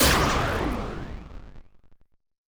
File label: laser weapon shot; mixkit